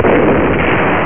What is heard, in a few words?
boom; Theldrow